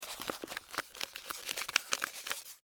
Newspaper Roll, Extermination, Newspaper, Roll, Insect, Bug; Sound Effects:Animals:Insects; Blastwave